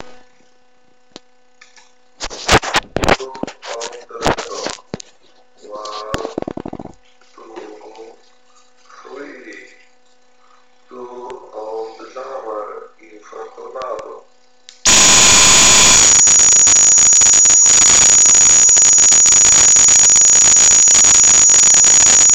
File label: Jammer vs Audio recorder real test 2 m; BAST V2